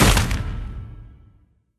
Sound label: Barbarians Druid Tower freeze; Kingdom Rush Vengeance; tower defense